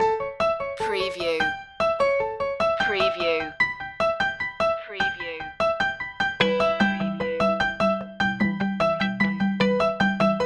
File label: latin flavor